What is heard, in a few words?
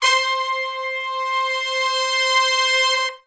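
Hi Long Note (C) unison, horn section, R&B; Sections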